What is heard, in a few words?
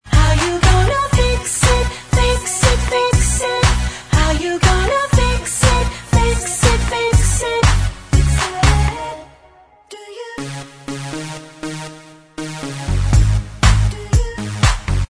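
Bvox Track, backing vocals track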